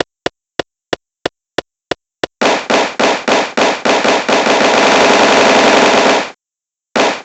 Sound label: breakdown; Gant